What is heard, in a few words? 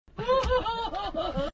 laugh ahh hahahaha